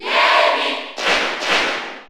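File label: Cheer Spanish, crowd; SSBU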